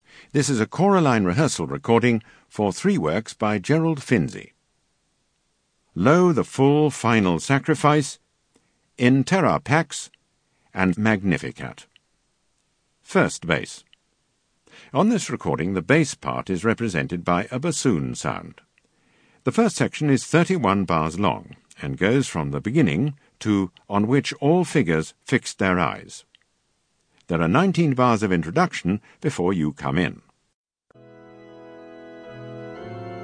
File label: Bass; Finzi Collection